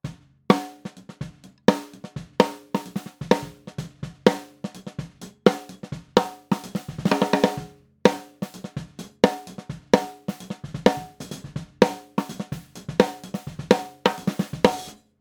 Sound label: SNARE GROUP